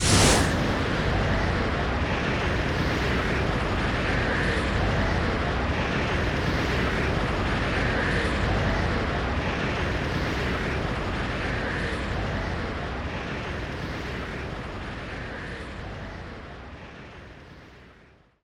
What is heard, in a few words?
Team Skill Whoosh Loop; gamerip